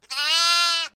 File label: bah; Devour 2021 gamerip pc macos